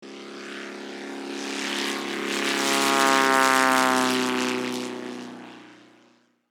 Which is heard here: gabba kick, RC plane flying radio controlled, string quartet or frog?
RC plane flying radio controlled